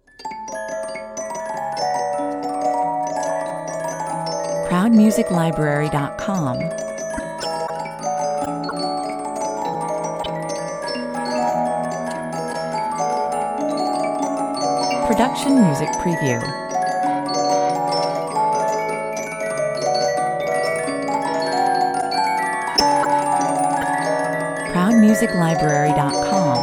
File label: music box theme